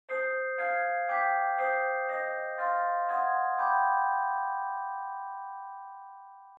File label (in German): Celesta; idiophone